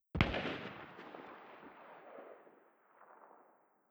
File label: sparks loud